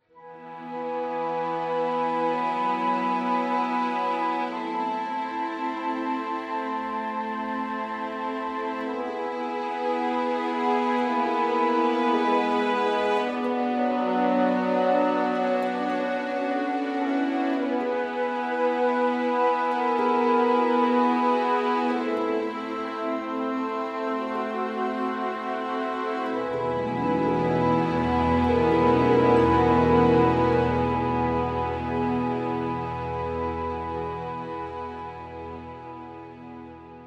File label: gentle trees